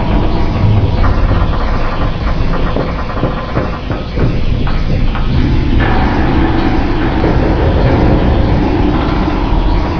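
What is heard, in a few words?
ambience tunnel; dm criticality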